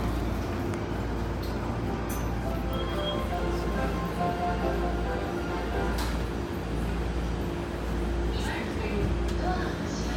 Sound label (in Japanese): JR武蔵野線南流山東, train sounds